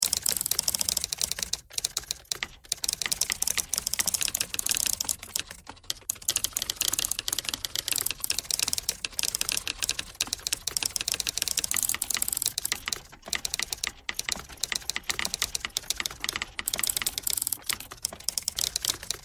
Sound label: typewriter thread pentatonic all